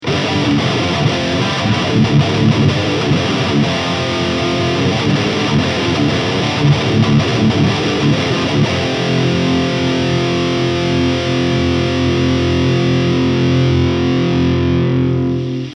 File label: V Black Satin High Gain